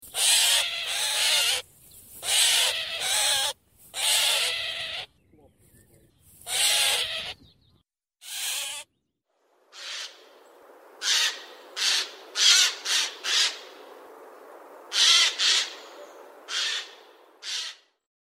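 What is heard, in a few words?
jay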